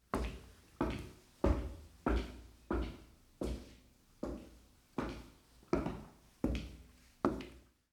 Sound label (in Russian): netoroplivoe hozhdenie v obuvi po tverdoj poverhnosti